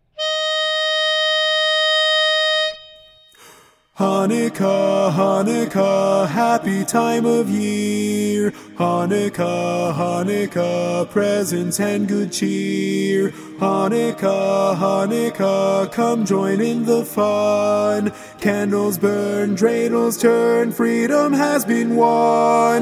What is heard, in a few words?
Chanukah, Chanukah baritone mono